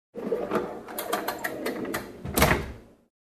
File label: Creaky Metal Door